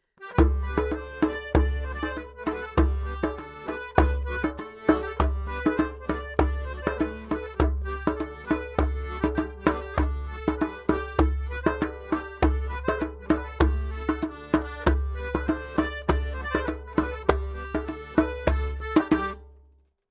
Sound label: Djembe